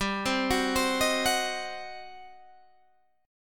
G Major 7th Suspended 4th Sharp 5th, G, down, Guitar, Open G